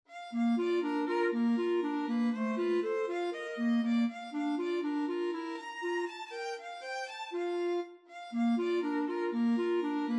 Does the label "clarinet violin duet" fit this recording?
Yes